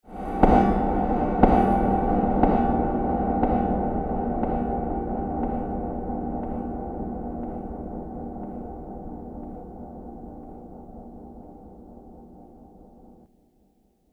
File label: Listen To The Knockers, scary soundscape